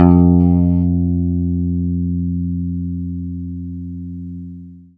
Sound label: Bass Pick F2